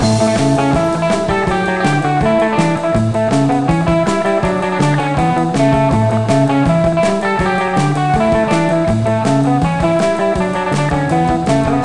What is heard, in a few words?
Music Beach